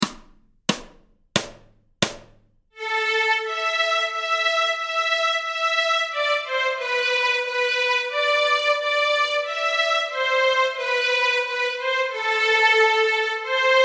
Stråk A moll, Nyckelharpa; Lilla lasse går och gråter; A-strängen Steg 1 Två toner i dur

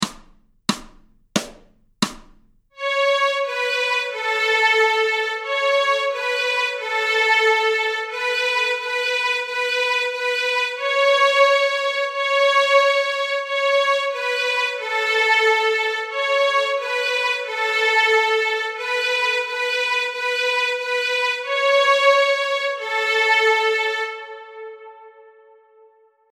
Stråk A, Nyckelharpa; A-strängen Steg 1 Två toner i dur

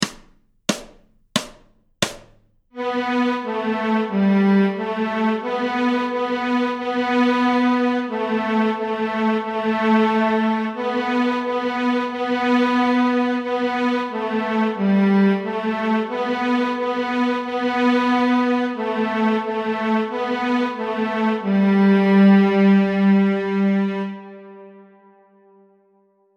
Stråk G, Fiol; Notträning

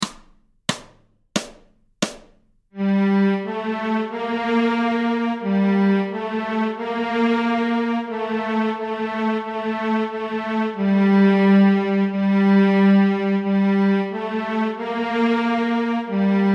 Ryssland Stråk Gmoll, Fiol

